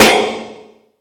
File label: big snare drum a key